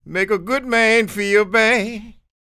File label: SING, Blues Soul; Spectrasonics; Vocal Planet